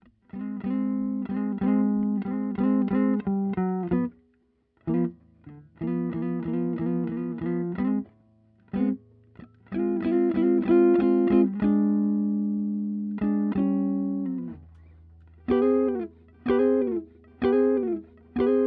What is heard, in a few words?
TubeTech Guitar